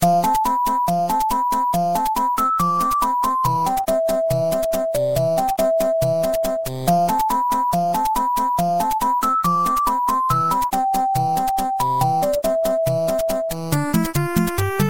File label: 8 Bit; Lost Woods